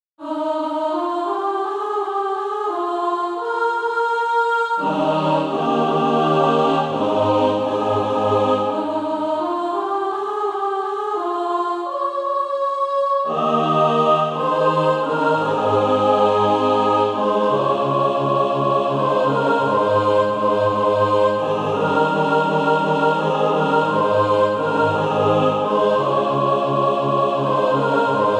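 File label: mixed, Practise Music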